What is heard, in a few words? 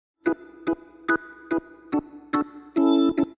Ska Organ; Loopology; Ska Till Ya Drah; Lounge & Reggae